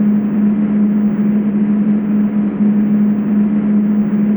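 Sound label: Aircrafts A-10 APU Cockpit; DCS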